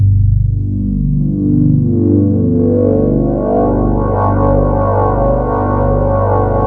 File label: SQ-80; Rubber Chicken Software Sample Bank; ENSONIQ